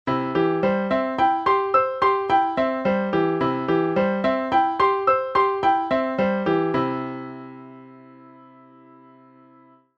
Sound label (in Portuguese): arpejo